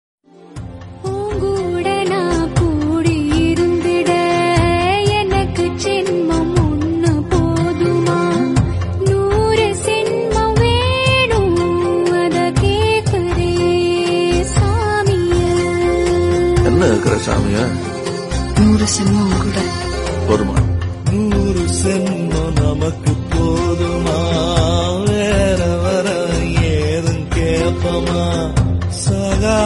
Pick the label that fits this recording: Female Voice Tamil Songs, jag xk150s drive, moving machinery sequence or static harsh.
Female Voice Tamil Songs